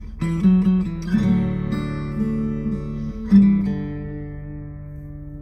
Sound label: Dueling guitars